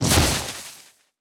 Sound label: symbol hold